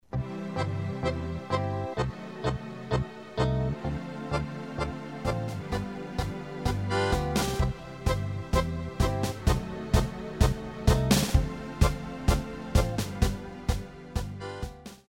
Tango

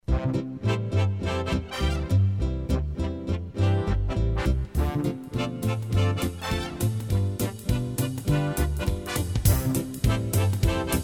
Quickstep

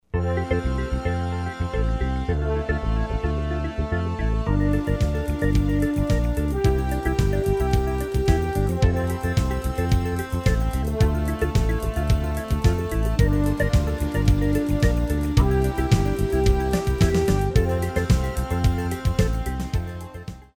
Disco

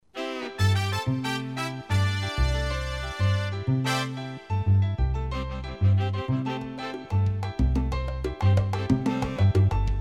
Salsa Mambo

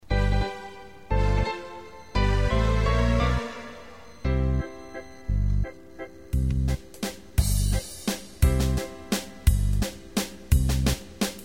Viennese

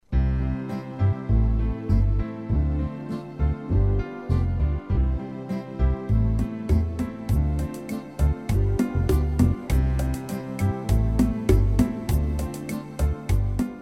Rumba

